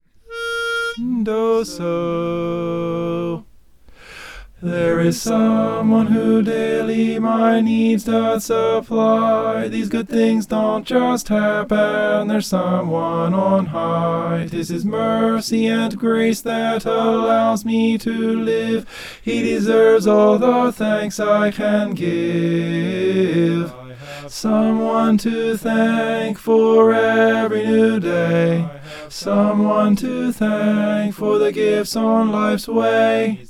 someone to thank tenor

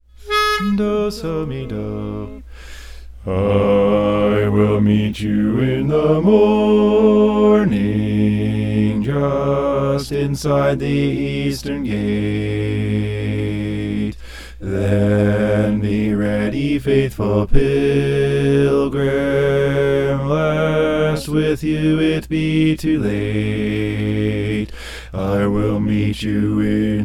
i will meet you bass

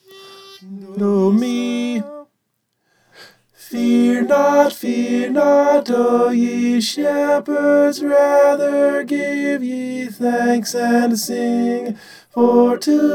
tenor

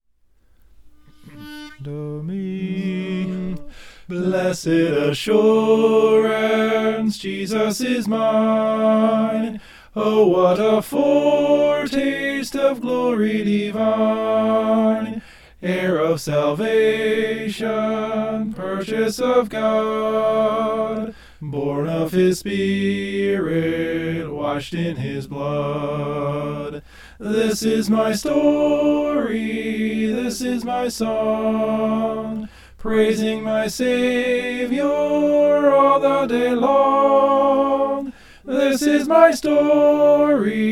soprano; blessed assurance

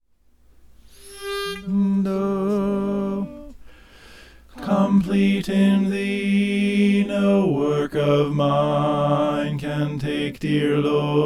bass; complete in thee

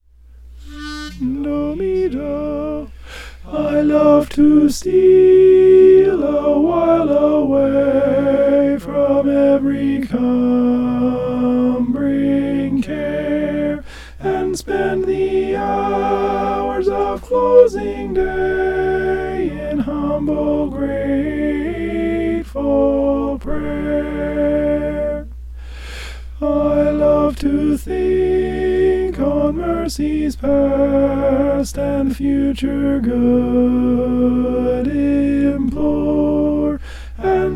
i love to steal alto

